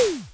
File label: bow release; heros